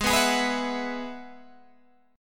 Ab Minor 7th Sharp 5th Ab, down, Guitar, Open G; strummed; 4D,4G,5D,5G,5B,6D; JGuitar

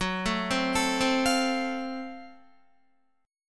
Gb Minor Major 7th Flat 5th, Guitar; arpeggiated; 13,12,12,14,12,13; 4F,4A,5C,5G,5C,6E

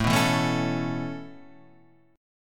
Db Minor 7th Sharp 5th A, chord

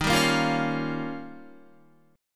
D sharp 9th, Guitar; D#9 strummed; JGuitar

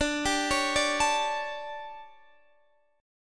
D Major 7th Suspended 4th, Guitar, DADGAD

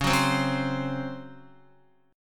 Db Major 7th Suspended 4th, Guitar; strummed; JGuitar

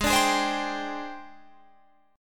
Ab Major 7th Flat 5th Ab, down, Guitar, Drop D

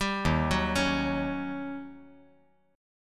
Eb 7th Sharp 9th, Eb; Eb7#9 arpeggiated; 0,3,2,4; JGuitar

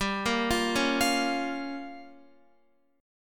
G-Minor 7th Flat 5th, Guitar, Gm7b5 chord; arpeggiated; JGuitar

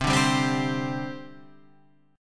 C Suspended 2nd, C, down, Guitar, Half Step Down

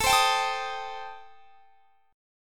A Minor 7th Flat 5th A; x,24,22,24,22,23; strummed; Am7b5

